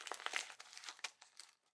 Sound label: ambience room shadow room working layer